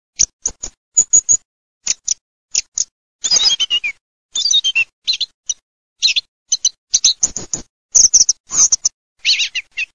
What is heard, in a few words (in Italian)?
TORDO